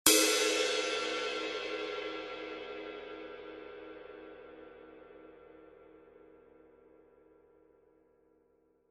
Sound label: 22in Custom Medium Ride, cymbal